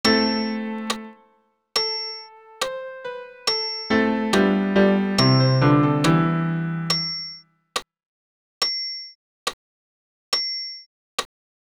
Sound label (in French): Ite missa est bas pian acc